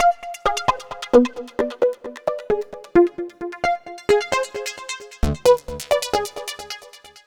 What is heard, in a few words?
Lead Synth